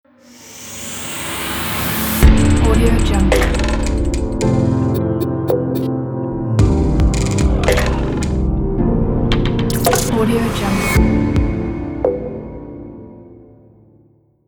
piano glitch modern logo

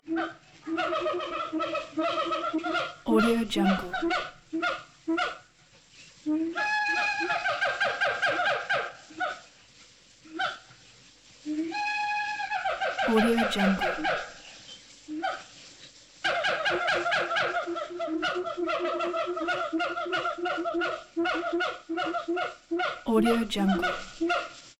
siamang monkeys

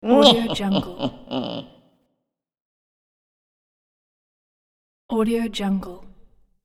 witch cackle

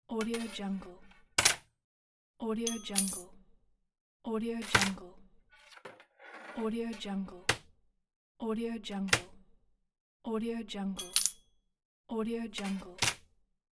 metal ruler

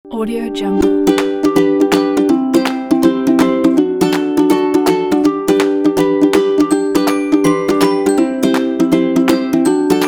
kids joy

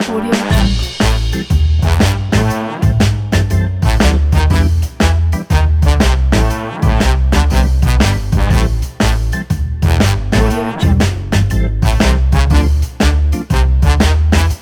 night show jazz

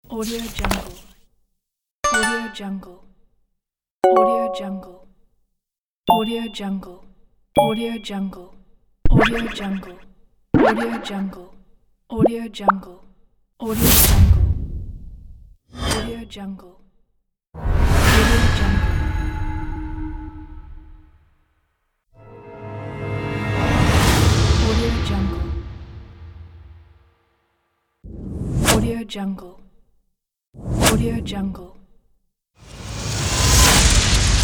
game sounds pack

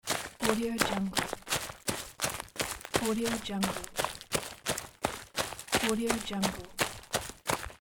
foot steps stones

